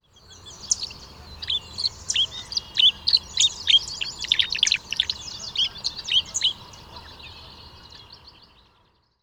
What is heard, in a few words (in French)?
Hirondelle bicolore; Lucas Schrader